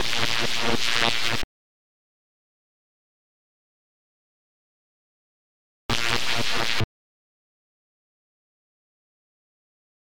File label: Electricuter